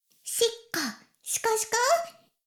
シコシコ音声; 古き城のドラゴニート

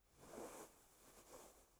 布ズレ

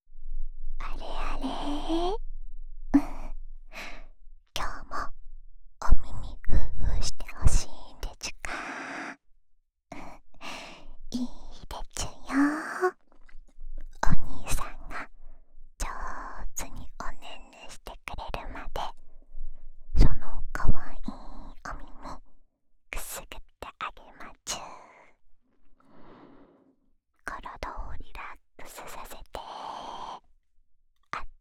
安眠用お耳ふーふーループ（左耳）, ASMR

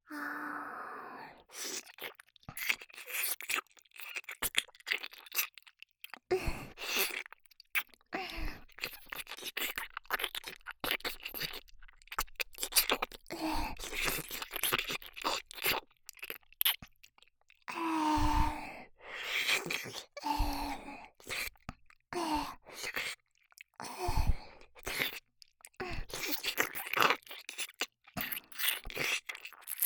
耳舐め リピート 左耳

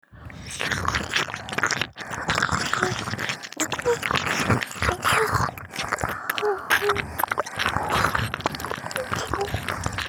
吐息耳舐め

